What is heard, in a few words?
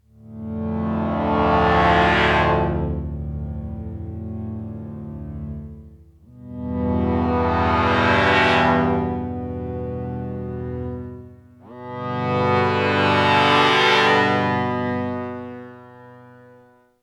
Modal Argon8